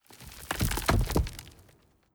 Hydra Stone

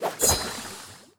Goblin Blade Slice Poison

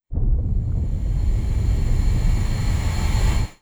Door Lock Light Up; gamerip

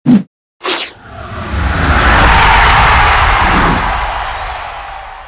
American football; Sfx Americanfootball

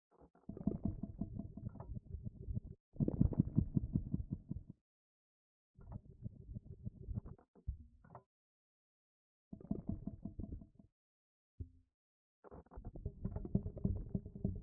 Purple Rumble

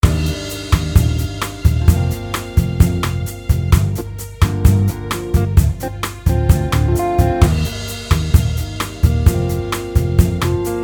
Elevator Music; Dragon Fantasy The Black Tome of Ice; 3DS

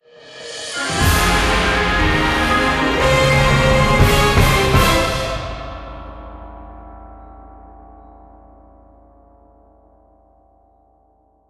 Victory Jingle 120 4-4; SunEaterPhase2Victory

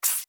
Rattle Snake; rabbids multiverse; iOS, macOS, Mobile

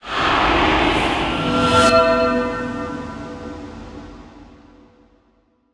Bad Cast Spell

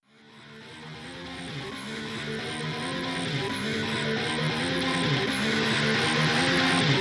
Power Moves Intro Fade; Justice League Heroes United

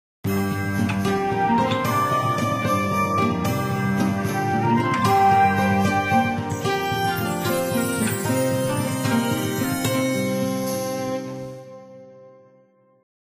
Level Fail; Buildalot

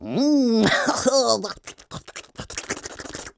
AMB China 3D Amb; Rabbids Multiverse

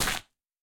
Footstep On Wet Sand

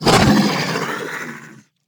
Monster Attack Finisher; Monster Lizzie

